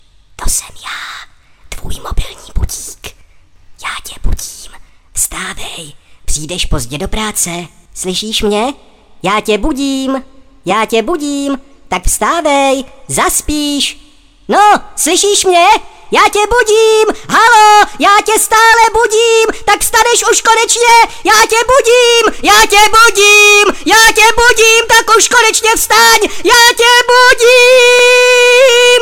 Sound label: Czech Alarm clock